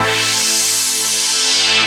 wah, synths; Notes

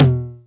mumsy, Toms Electro

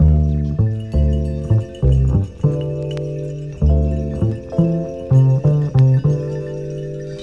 bass smooth, Loops

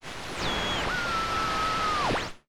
fuzz; Zaps and Beeps

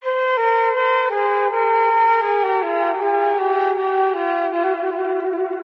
flute, Loops

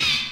screech

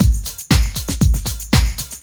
housey, funky drum loops

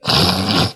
snorting

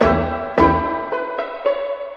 strings pluck, Loops; Phonky

